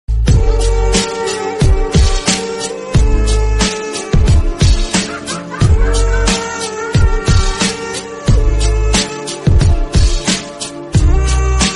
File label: bgm instrumental